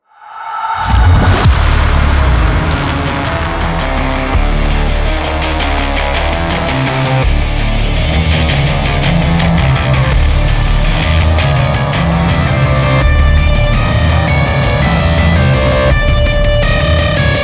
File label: PVP Lose